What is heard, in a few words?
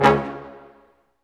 BRS, Ensembl stac; Roland L-CD702